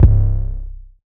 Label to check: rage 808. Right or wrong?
Right